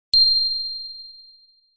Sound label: elearning correct answer sound